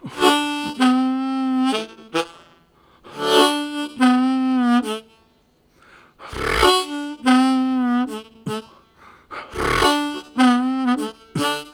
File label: Low, Harmonica; Zero G